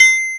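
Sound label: triangle, Roland D-10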